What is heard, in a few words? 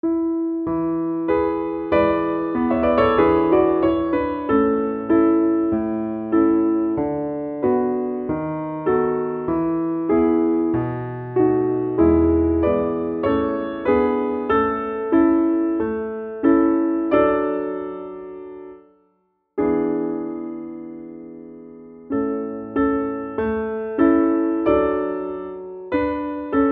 slower tempo piano accompaniment; Mozart; La ci darem la mano